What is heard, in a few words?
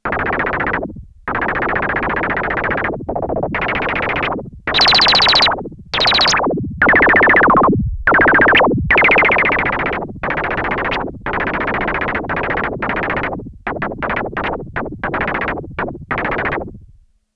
MACHINE GUN, Roland SH-101; Factory Patches